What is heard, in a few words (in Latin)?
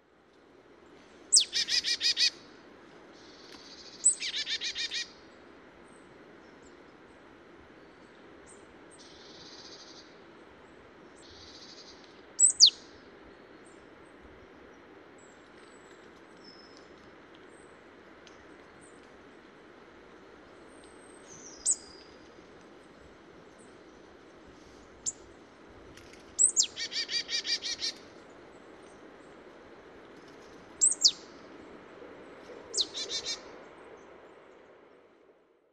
Poecile palustris; SETE